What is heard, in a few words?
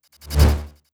Jolt Standard